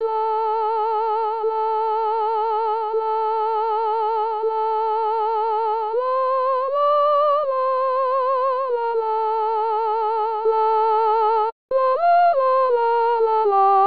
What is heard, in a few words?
Kousk soprane